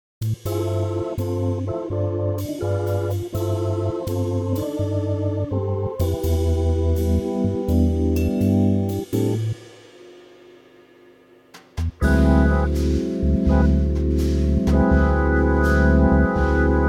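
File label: organ C, backing track; key - C - vocal range - B to E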